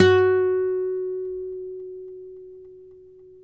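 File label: nylon, FLAMENCO, Guitars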